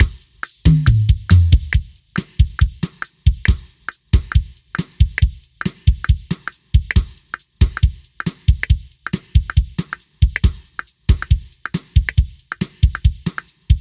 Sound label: Bossa Nova